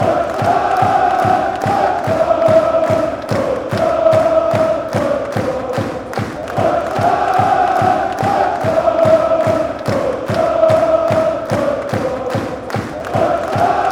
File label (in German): Deutschland Deutschland, fanchants